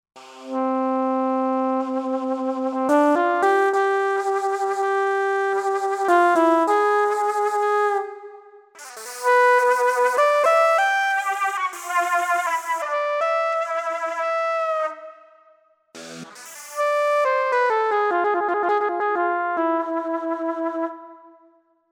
Shakuhachi, synth

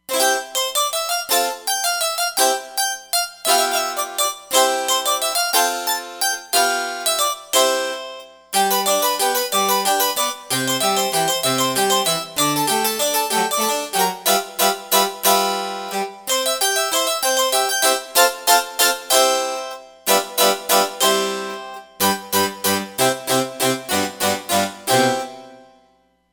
Couple Harpsi

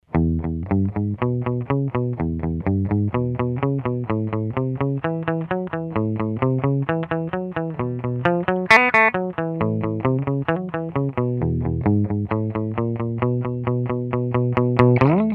Black Panel, Roland Micro Cube

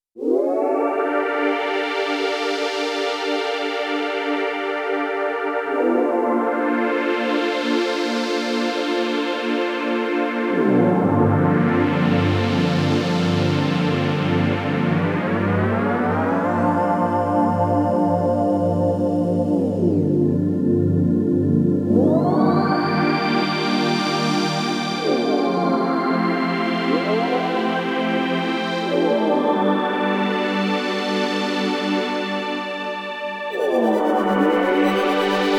Glide 5th Pad, Korg Radias-R

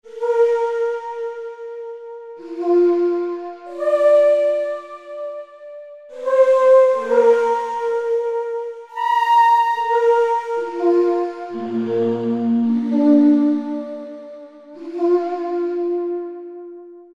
Conch Call; World; Roland SR-JV80-05

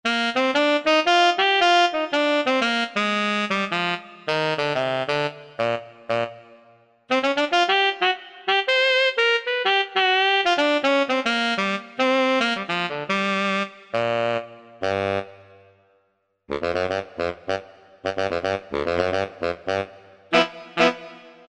Solo Sax, Korg M1; Factory preset examples

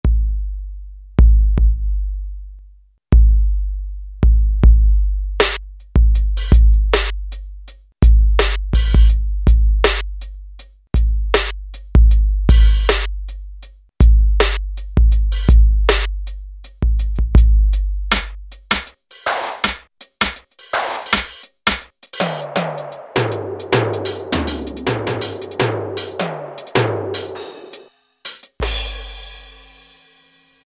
Rap Kit; Roland L-CDX-01 Rhythm Section Instruments

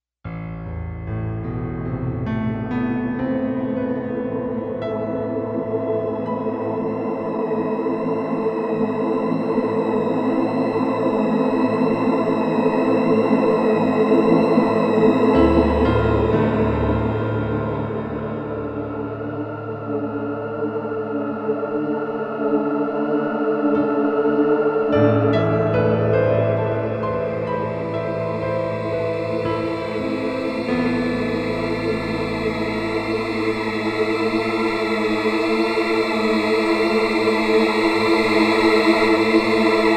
Piano

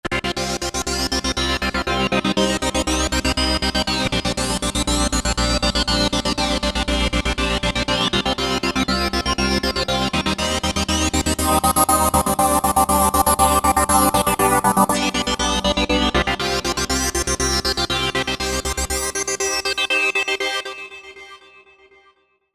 Slice Dice

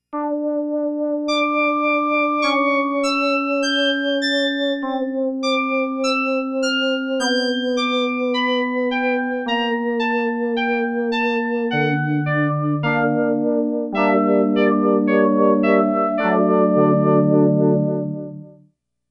Tremolo Rezz

